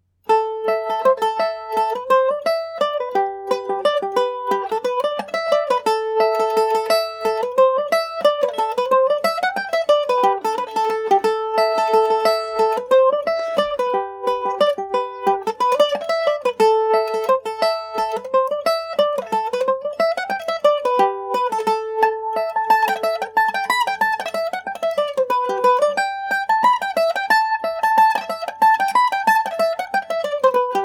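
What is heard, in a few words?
A dorian, irish mandolin; The Bag Of Spuds; Eastman MD304 mandolin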